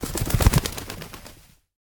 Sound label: bird habataki; anemoi